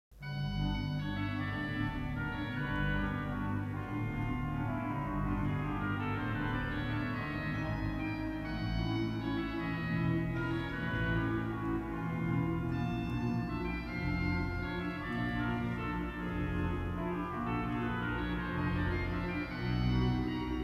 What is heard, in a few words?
Spanish Dance, organ; MiguelPerez; bruselas